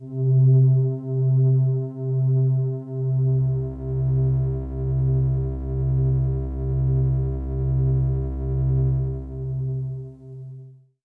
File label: Pad C, Synth Prophet One Shots; Electronisounds